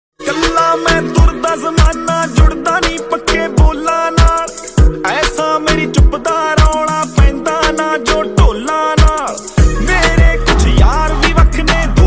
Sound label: Desi Punjabi Ringtone